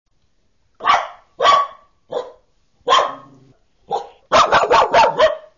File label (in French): nature aboiement